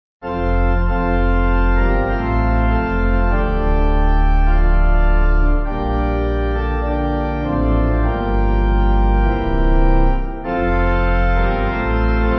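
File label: Pipe Organ; Cranham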